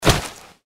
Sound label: gecko swing; npc